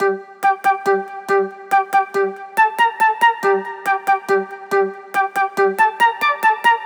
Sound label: Flute Plucky 140BPM Gmin; 99Sounds x Monosounds; Instrument Loops